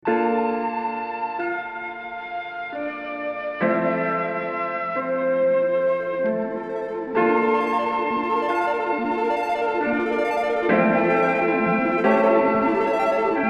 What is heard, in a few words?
Keys Capitol Chambers Wet; UAD Spark